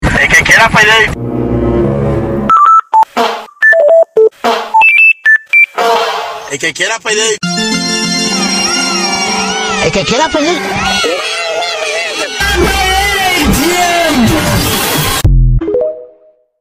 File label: goofy ahh; Voicemod